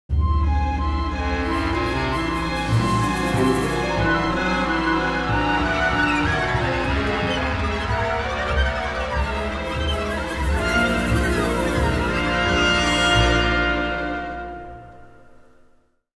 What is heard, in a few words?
Supernatural; Mood Supernatural